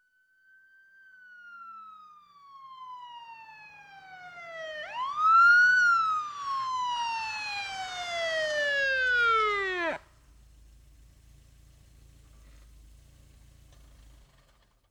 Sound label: Police Car Arrives With Wail Sire; Emergency; BBC Sound Effects Library